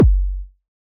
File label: Muay Kick